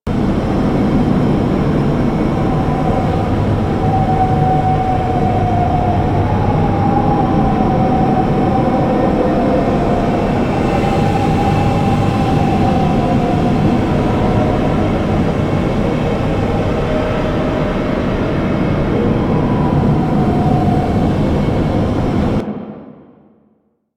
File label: Shipyards Interior Amb; Warframe; Ambience; Grineer Shipyards